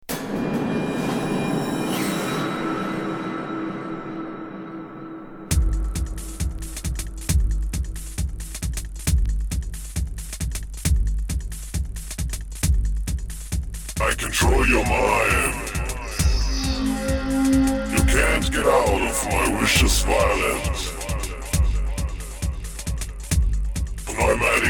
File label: Pneumatic Drill Synthetic Terror Mix